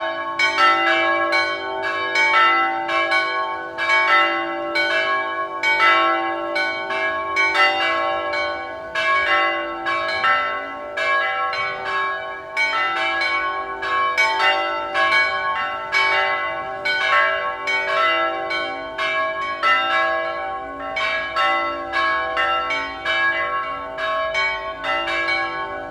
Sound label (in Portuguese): Sinos de igreja ao final carro passa e sinos param